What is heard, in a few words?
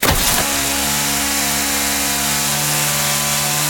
Kill Player Chainsaw; MiSide